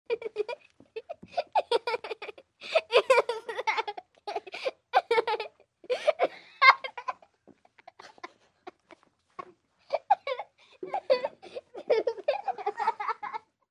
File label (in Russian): smeh malchika okolo 6 8 let